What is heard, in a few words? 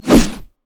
basic attack claw; rengar